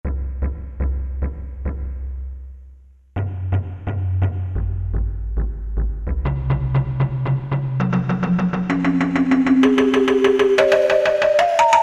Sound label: Taiko Sweep; Korg MSC-1S Variety